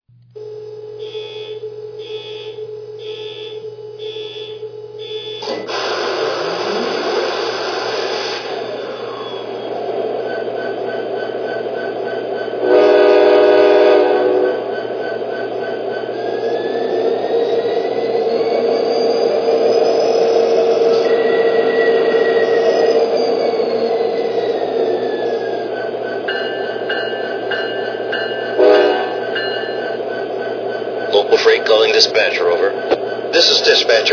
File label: sd70ace